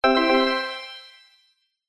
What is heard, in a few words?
game tone positive digital organ style